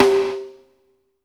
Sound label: HIT VERB, PROC SNRS, Drumworks